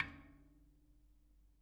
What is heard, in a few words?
Bicycle Spoke